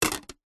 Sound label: household plastic plate set down stack on another; zapsplat